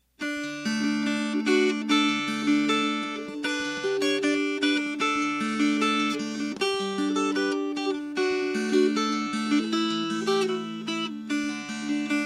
Fingerpicked

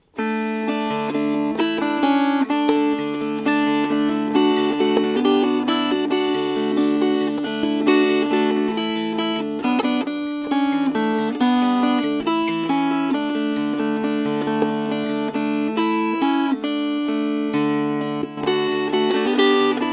Fingerpicked; In Good Old Colony Times

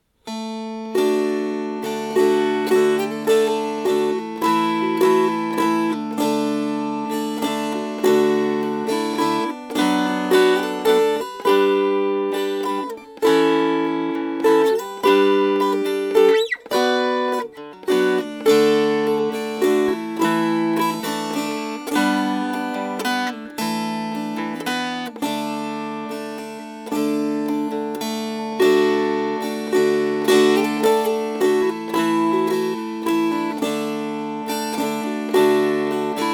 Strummed

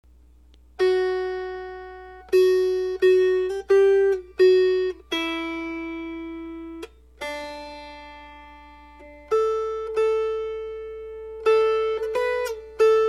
Melody

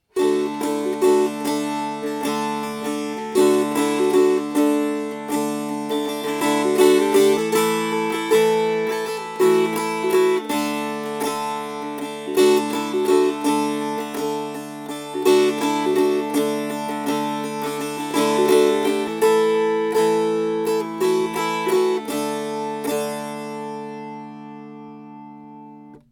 Strummed

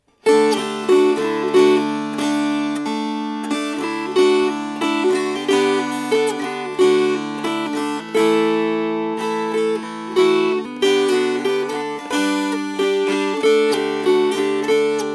Strummed

